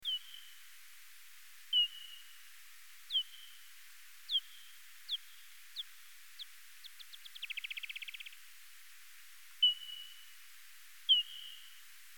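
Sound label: Ozimops ridei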